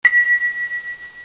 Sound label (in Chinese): 叮长